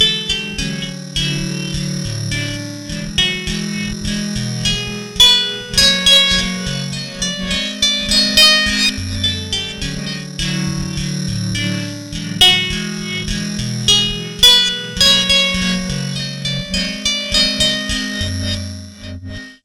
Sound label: guitar loop; modelonia; guitar dry loop